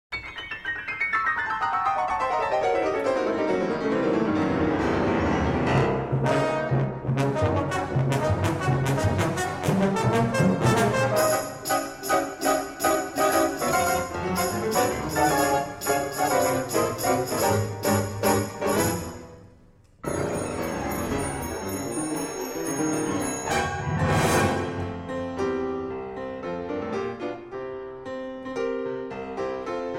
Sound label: Concerto for 2 Pianos